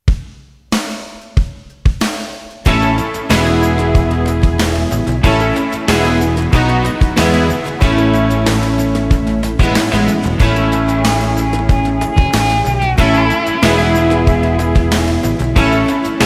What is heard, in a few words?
instr